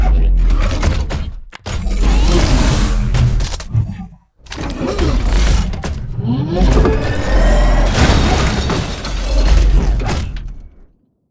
Zipline Fight Close; Beacon; BT; Titanfall 2

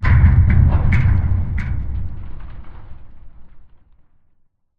dish crane move stop metal stress; Titanfall 2

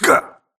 grunt death neck; Titanfall 2

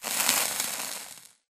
imp bullet energy default sizzle; Titanfall 2

